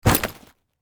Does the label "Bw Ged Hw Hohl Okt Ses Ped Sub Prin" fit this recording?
No